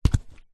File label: foley footstep single boot wet ground puddles